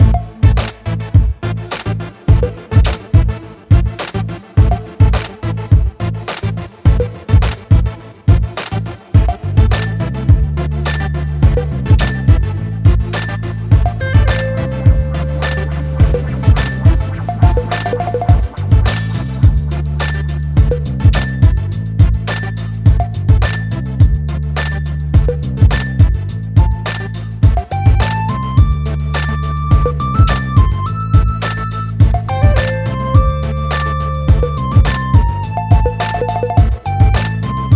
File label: Hip-Hop Ambience; America's Next Top Model